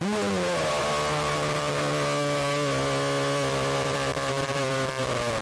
distorted scream long jumpscare layer creepy horror